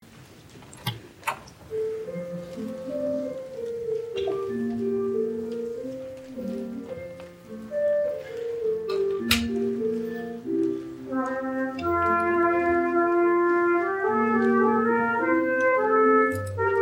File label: trumpet